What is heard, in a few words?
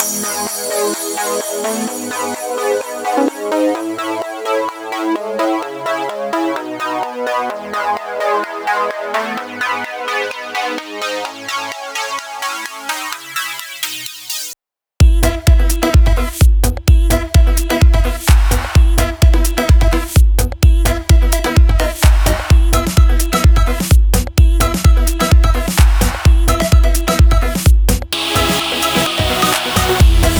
FULL MIX, Big Room House, Key A, Bpm 128; Loop Nerds; Big Room House Allstarz